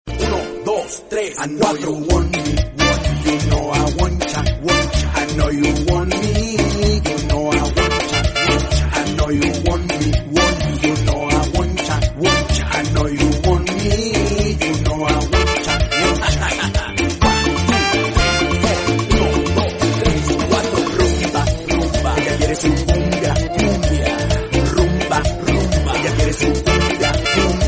cumbia

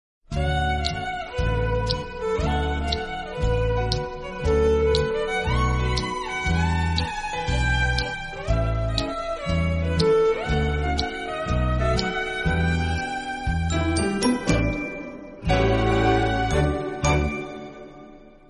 romantic

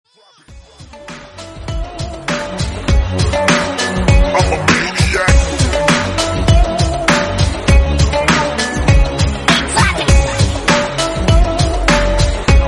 music

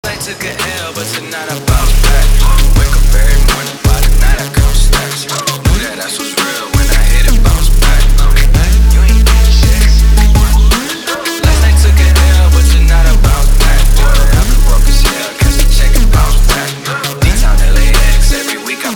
trap remix

